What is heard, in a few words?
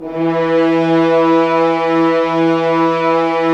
Horns; Roland - LA Composer vol. 4; HZ Horns